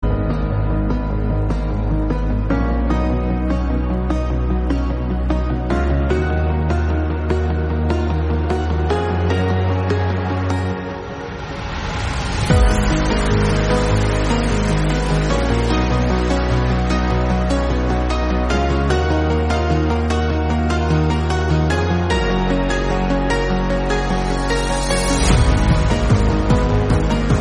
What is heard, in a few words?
instrumental